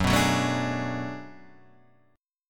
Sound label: B Minor 13th F sharp